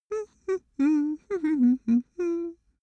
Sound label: cartoon male hum sequence